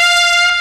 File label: rumpet, Synths; Notes